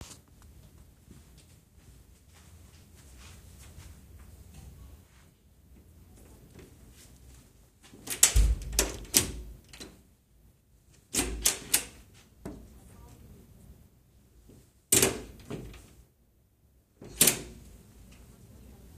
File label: doors